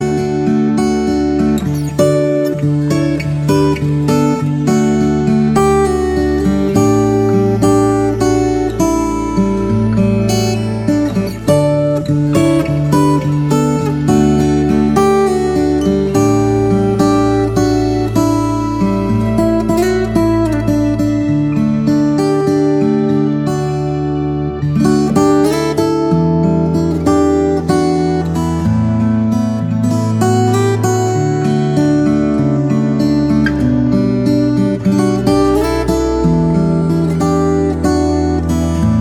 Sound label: Instrumental